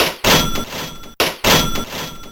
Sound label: beatbox; pinball dreams; Blaizer